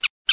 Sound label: DOG; windog10